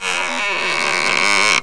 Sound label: CREAKING; terror